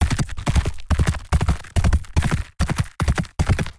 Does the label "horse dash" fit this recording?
Yes